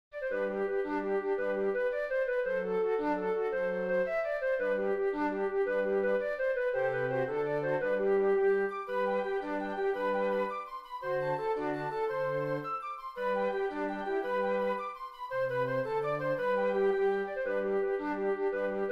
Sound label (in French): ensemble de flûtes; The irish washerwoman